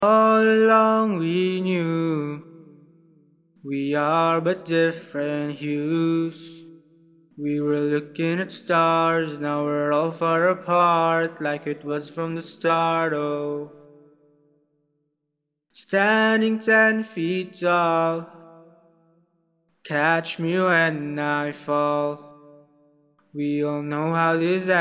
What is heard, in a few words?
edm, 120, A, Acapellas; Fall EDM Version